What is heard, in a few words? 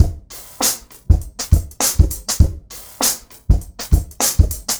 Remixed Drums 100BPM; The Roots Drumloops